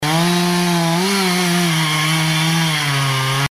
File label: chainsaw